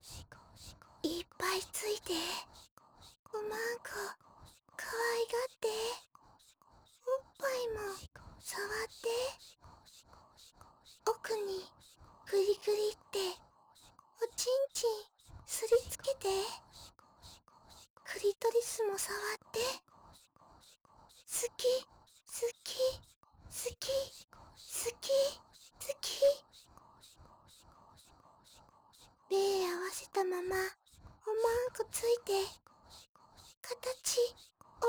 シコシコ ハッハッ セリフ 普通

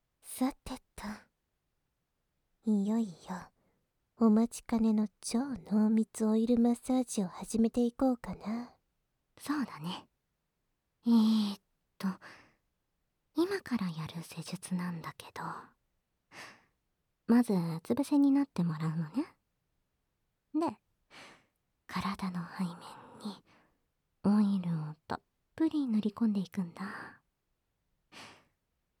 うつ伏せ耳舐め＆鼠径部マッサージ(SE無し), ASMR

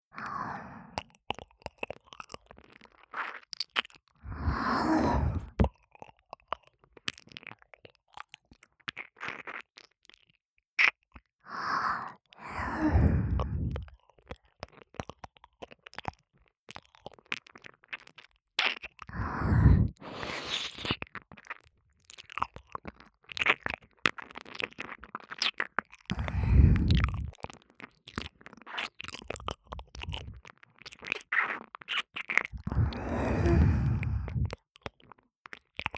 左耳舐めループ; ASMR; 通常耳舐めver

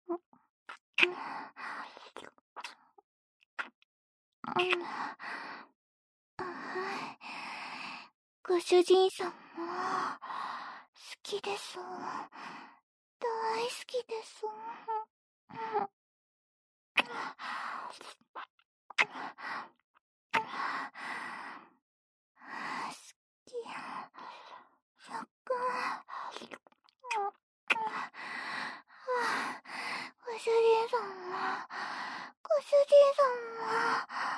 甘キスループ; 通常耳舐め

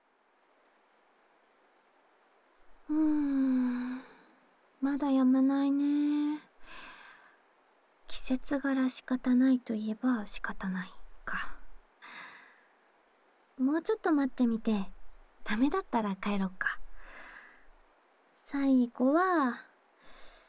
梵天 ～梵天耳かきASMR～; 二人の時間

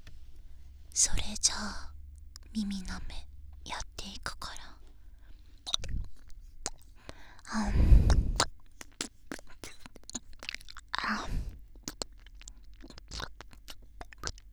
右耳舐め, ASMR

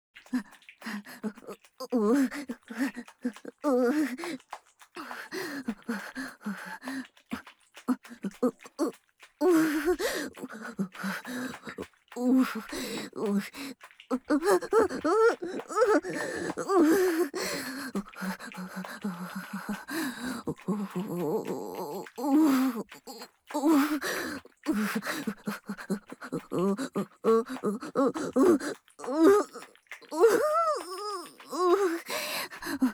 オホ声（SEあり）娇喘

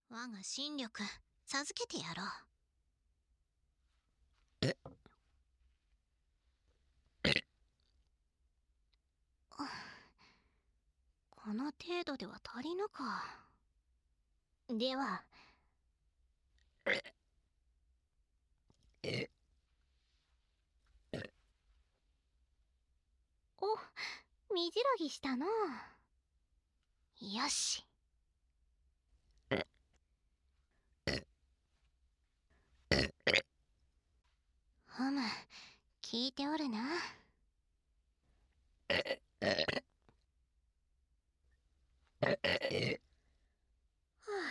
抜きゲップ, 台詞のみ; 黄名子

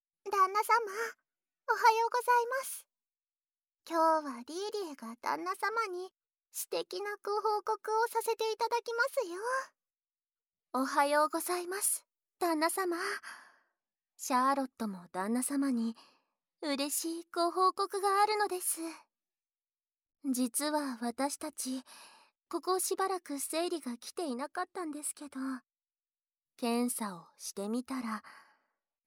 懐妊耳舐め, ASMR

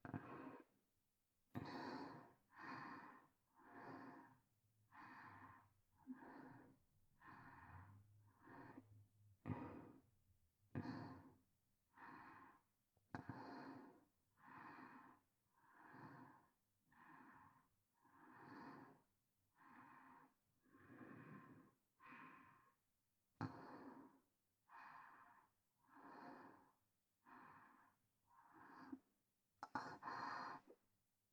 バック喘ぎ; ASMR

